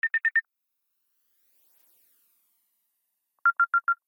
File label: doppler shifted ringtones ios